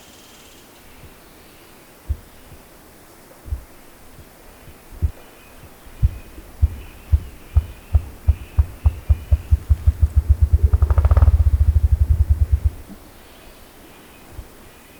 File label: Grouse